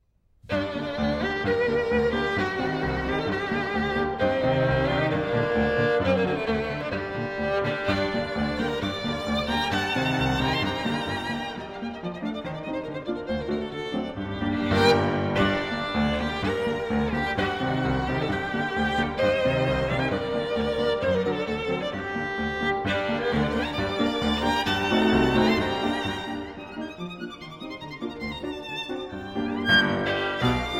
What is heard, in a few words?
Hungarian Dances, Allegro; 21 Hungarian Dances, WoO 1 No. 5 in F-Flat Minor